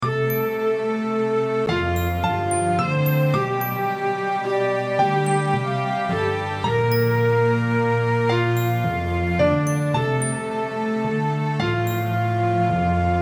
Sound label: Opening (Orchestra); dragon fantasy book i the volumes of westeria